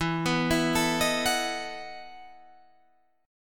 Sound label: E Major 7th Suspended 2nd Suspended 4th, Guitar, Open G